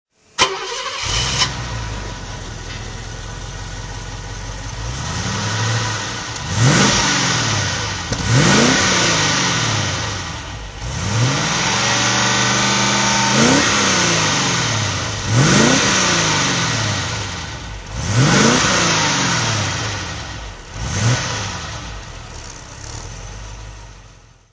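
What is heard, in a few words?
mercedes 280se 3.5 V8 throttle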